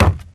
Mvmt FS Jog Wood Layer; AI Stalker; Titanfall 2

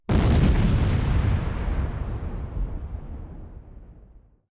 weapon fire explo; Titanfall 2

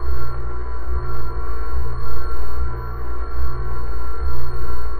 console; Titanfall 2

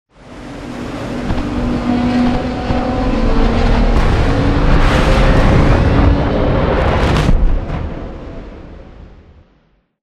dropship exterior; Titanfall 2

